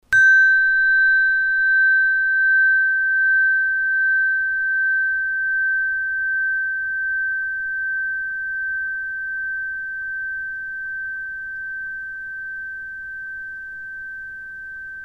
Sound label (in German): trigon synodischer tag